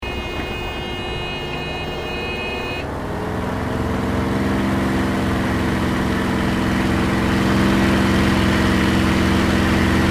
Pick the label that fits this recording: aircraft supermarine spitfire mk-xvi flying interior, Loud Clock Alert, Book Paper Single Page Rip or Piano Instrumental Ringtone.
aircraft supermarine spitfire mk-xvi flying interior